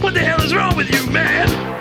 what the hell is wrong with you man SUNG

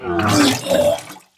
vomit

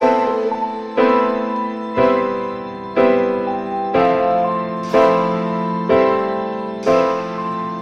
keys, Loops, Sad

